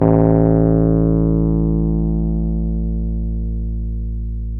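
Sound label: piano, Wurly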